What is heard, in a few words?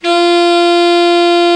Alto Sax; Sound & Vision - Gigapack II CD 1